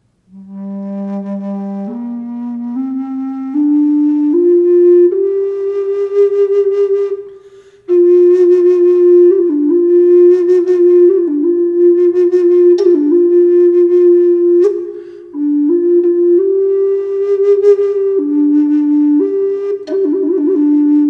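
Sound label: reverb, flute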